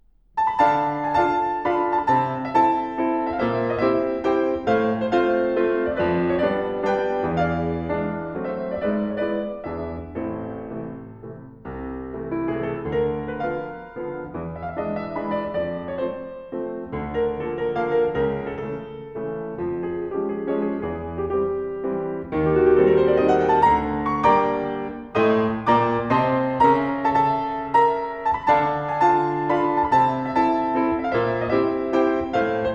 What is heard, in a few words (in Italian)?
Suite di danze 3 Mazurca Pianoforte